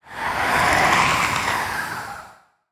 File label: Zombie Throat, Monster Sounds; TheDeclineOfWarriors; Monster Sounds Volume II